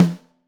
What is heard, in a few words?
SN2 Top, Snare; Ableton Foundation FreePacks; Snare Designer